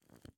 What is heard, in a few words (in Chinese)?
蜡烛环境声; ambient